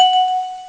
pling; ST-XX Amiga Soundtracker Sample Packs

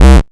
Basses Root C

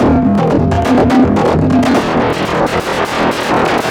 Processed Beat, Drums & Percussion

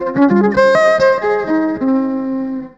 EVIOLIN, ELECT VIOLIN

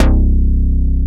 bass FM; Best Service Gigapack 2

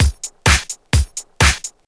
Straight Loop 128 BPM, House

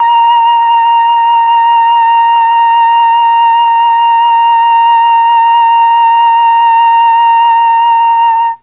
A#3 TRON FLUTE, Mellotron; Mike Pinder - Mellotron Archive CD

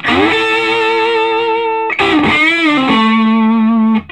Vintage Blues Guitar, SOLO

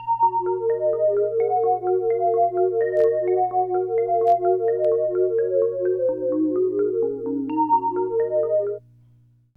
AMBIENT, SYNTH SEQ; AMG; Global Trance Mission VOL-2

